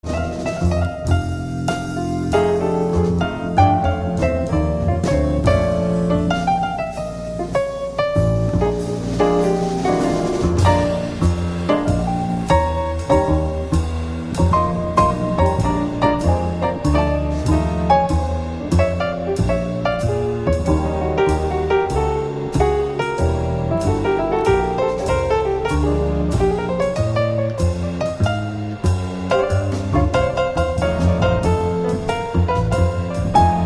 jazz trio, blues

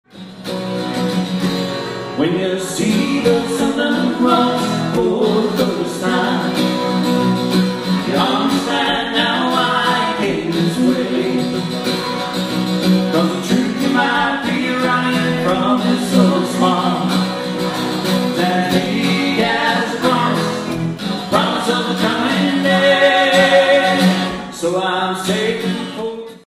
Acoustic Duo

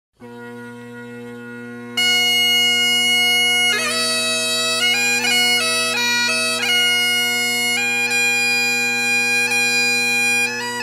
Bagpipes

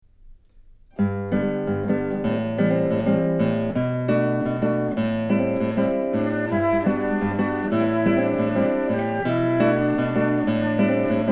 Classical Duo

